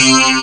synthe metal high; Amiga Soundtracker Sample Packs

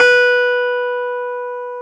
KEY X Hohner Clavinet; Clavinets; Club-50 - Foundations

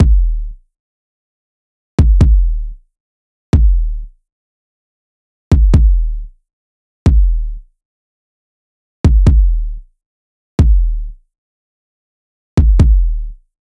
loop kick 136; Seismic Loops

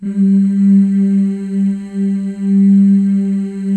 FEM, Symphony of Voices; Spectrasonics

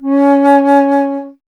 Alto Flute

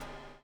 BUBL, Backbeat; Spectrasonics - Backbeat

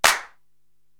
Hand Claps, Korg TR-Rack drum machine

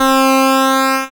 SYN, DANCE SYNTH

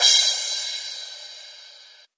Crash, Drums Cymbal

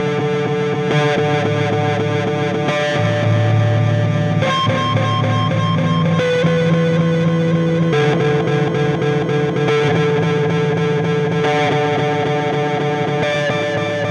Guitar, Indie Rock Anthems; Sonic Foundry (Sony Creative Software); Session 04 - Dubwyzz

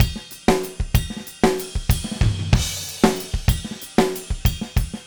Heart Samba with Fill, Latin Percussion, Drumset; Sonic Foundry (Sony Creative Software); Joe Vitale Latin Percussion

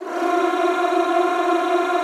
F3-R, Extended Classical Choir; Best Service

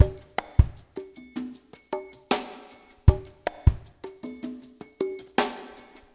ICE BRUSH WETMIX, Liquid Grooves; Spectrasonics; Liquid Grooves 1 & 2

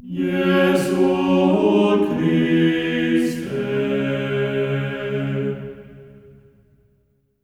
JESU C#4, Symphony of Voices; Spectrasonics

